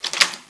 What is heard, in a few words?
unlock; svencoop